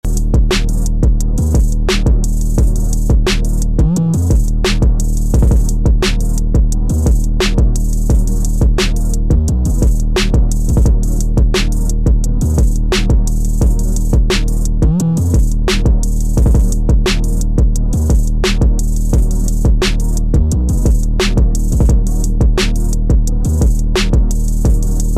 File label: instrumental